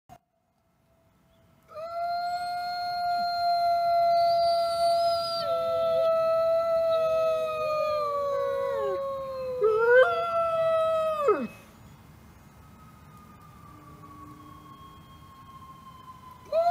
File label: The Wolfs Howl